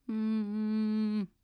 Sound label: moan, female; Half-Life 2